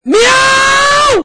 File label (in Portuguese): miau estourado